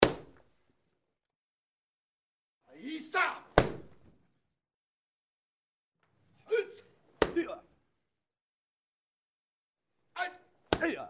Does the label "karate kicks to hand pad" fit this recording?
Yes